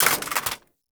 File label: AK47 Reload Mag Drop; Warframe; Tenno